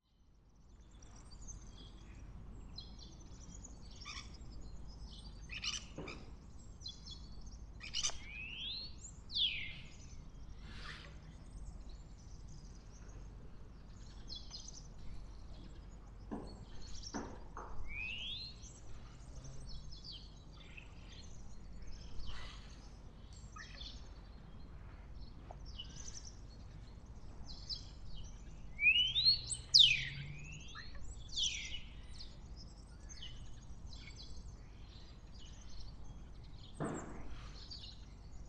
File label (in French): Etourneau sansonnet Sturnus vulgaris